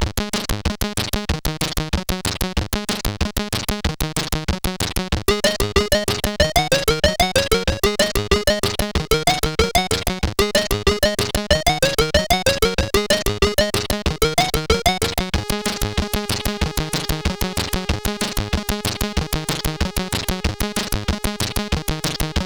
sid CSG8580R5

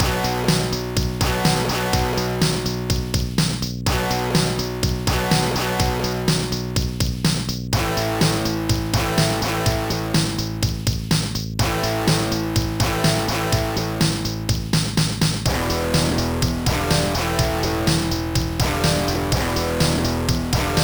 metal medley; Sonix Music Driver

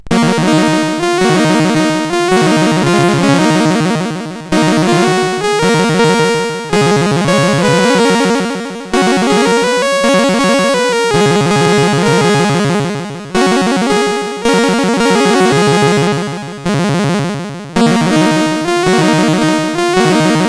sid CSG8580R5

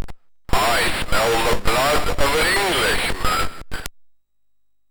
sid MOS6581R3; Out on a Limb

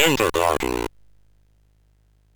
sid MOS6581R2; Cave of the Word Wizard

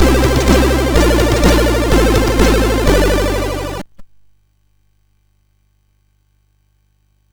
sid MOS6581R4; Thunder Hawk

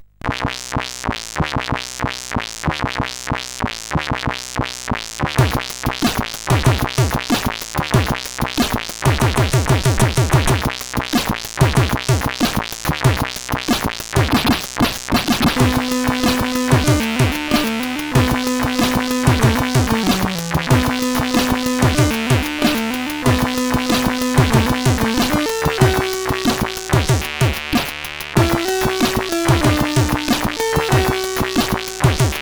First Shower tune,sid CSG8580R5

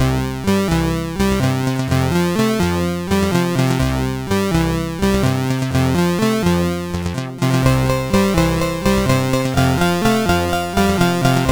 MOS6581R4, sid; Action-Ball

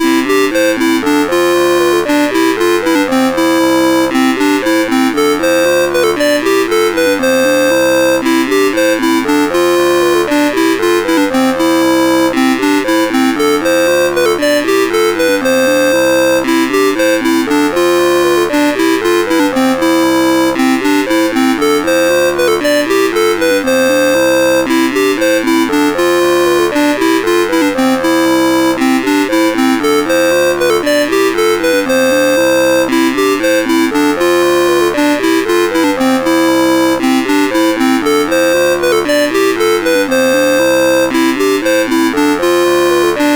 Happy Birthday, CSG8580R5; Happy Birthday Q-Link

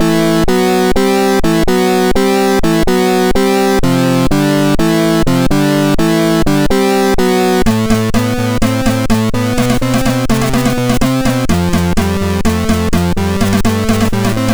Ringtone, sid, MOS6581R2

